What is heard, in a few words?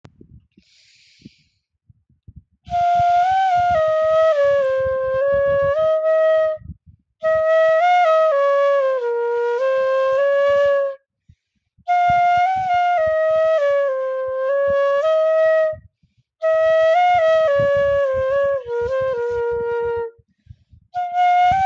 Flute